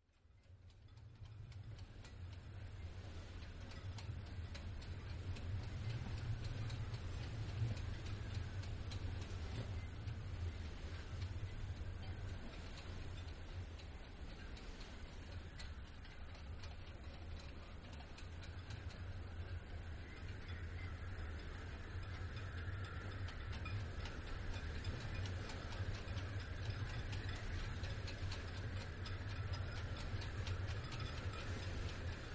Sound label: Strong Storm Wind In Rigging; Wind (Sound Effects:Weather)